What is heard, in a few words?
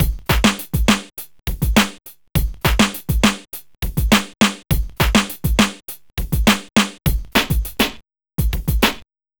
beat